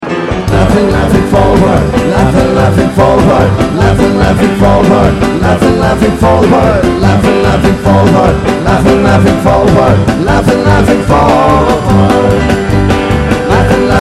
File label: laugh ring